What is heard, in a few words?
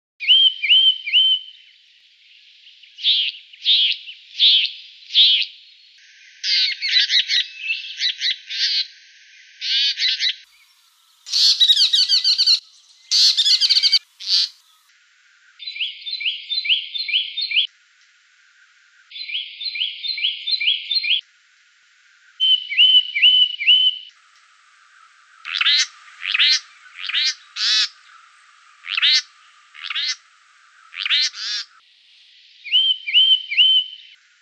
leaden Flycatcher; flycatchers; canberra garden birds